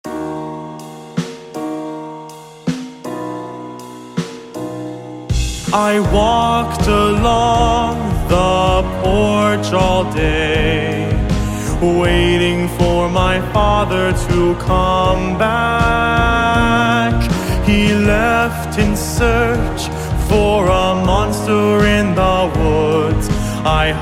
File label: SIREN HEAD SONG